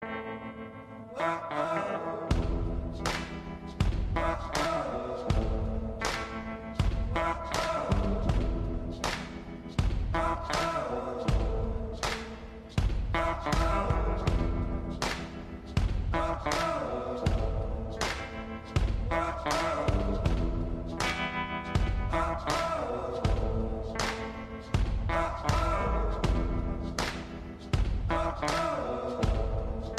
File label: menu song; Ride 3